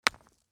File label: Hit Rock With Sledgehammer, Hitting Rock With Sledgehammer; Bright Clink; Objects